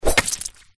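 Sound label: sword stabbed into body and splashes of blood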